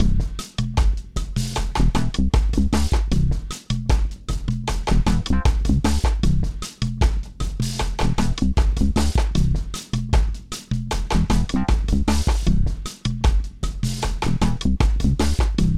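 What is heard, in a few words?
Swedish Reggae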